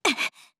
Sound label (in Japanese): 攻撃